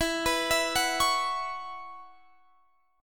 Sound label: B Suspended 2nd Suspended 4th